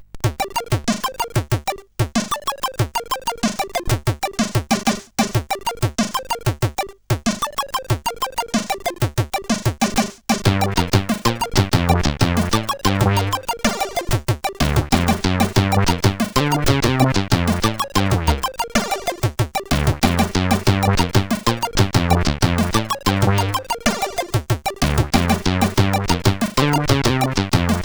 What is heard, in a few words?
Acid, CSG8580R5